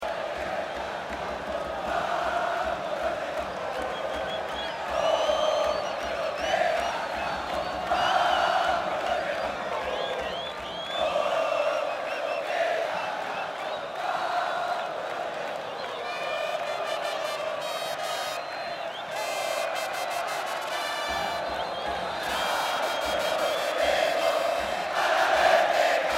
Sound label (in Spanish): PARTIDO DE FÚTBOL GRITO GOL MAS BARRA; CRISTAL VS ALIANZA; ALIANZA LIMA